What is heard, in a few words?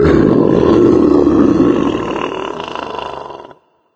mancubus die; doom3